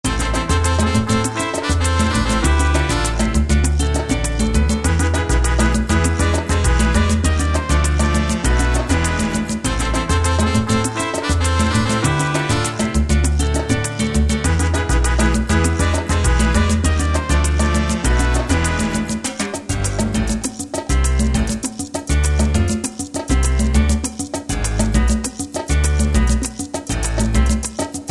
salsa

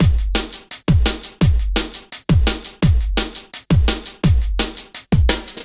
cool beat

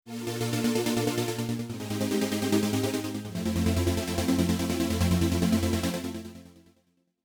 Pad Rhythm

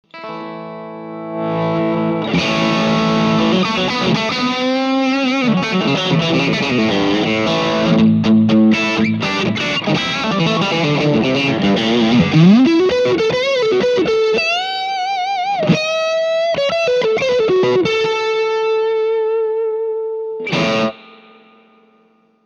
Edition 60 Strat

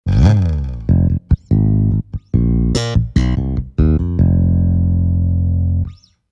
Tyros Mega Finger Bass

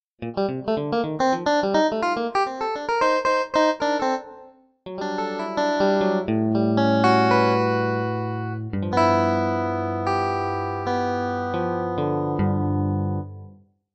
raw electric

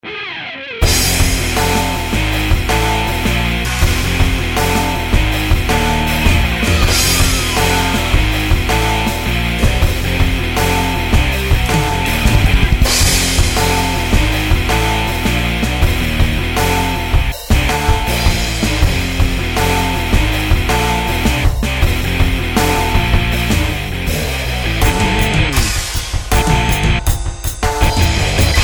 Metal

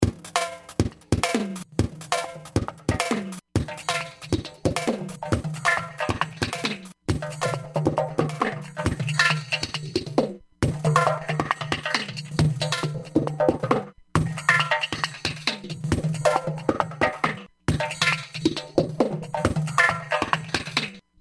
Drums

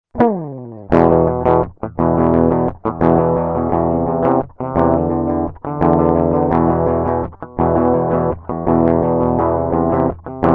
Electric Guitar

